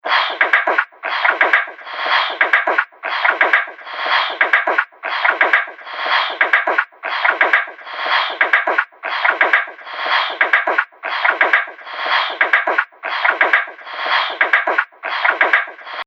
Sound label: 120 Bpm Beatbox Loop, Vocal Loops; Percussive And Rhythmic; Seamless loop